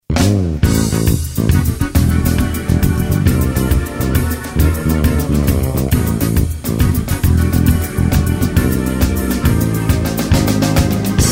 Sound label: turkey waltz